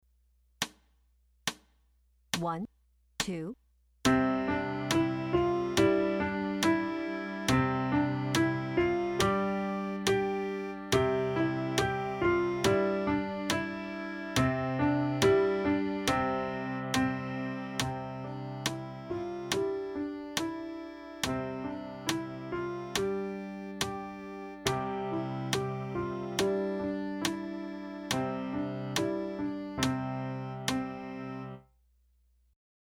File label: Hungarian Folk Song